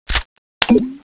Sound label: clip in; tfa cso; crimson hunter